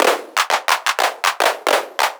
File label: 707 mod Clap